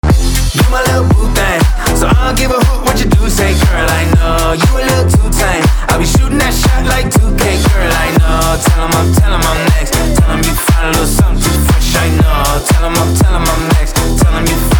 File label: Club Party Banger